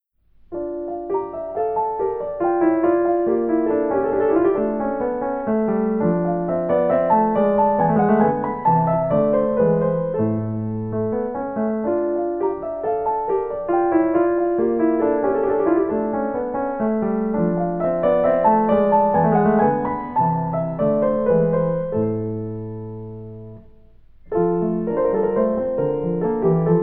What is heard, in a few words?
French Suite D Minor Menuet; J.S.Bach; BWV 812